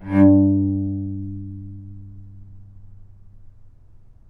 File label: G2 - Sforzando, novice cello